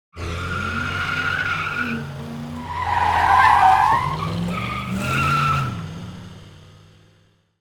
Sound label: Car tire skid fast tire skid on asphalt with squeal